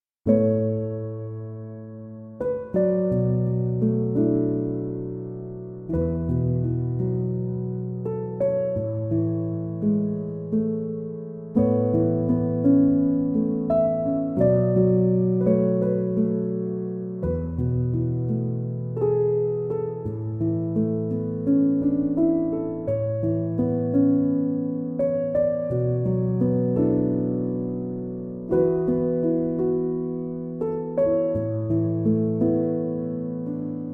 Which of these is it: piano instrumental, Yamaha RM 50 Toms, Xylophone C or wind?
piano instrumental